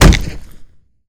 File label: Fall Hard, Impacts; zps